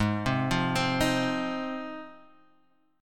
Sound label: Ab 6th Ab, Guitar, Standard; arpeggiated; JGuitar